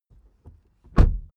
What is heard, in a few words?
suv close door